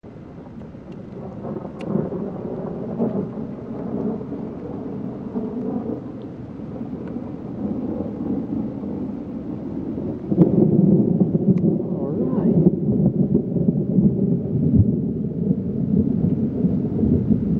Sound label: thunder